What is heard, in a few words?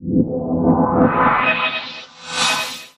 Eerie Uncertain Noise Transition